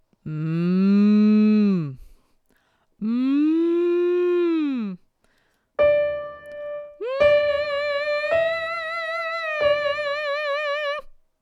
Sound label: Cow Hum; Cow noise humming